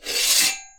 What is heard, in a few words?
draw; bri bri bicus